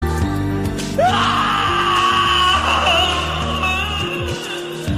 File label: grito; seiya; saori saaan